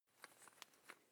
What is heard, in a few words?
electric drill bit fasten wrench turn slow